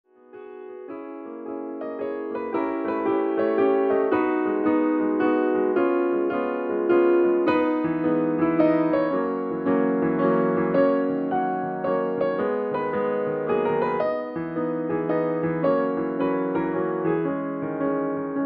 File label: rain, piano